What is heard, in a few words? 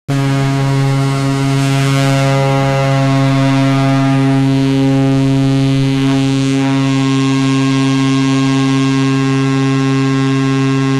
warship ship horn; maritime